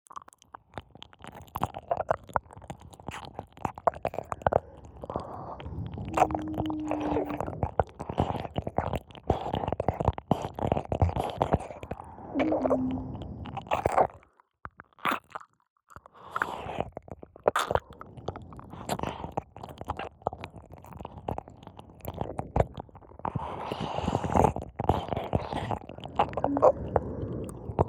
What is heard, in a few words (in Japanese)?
両耳舐めループ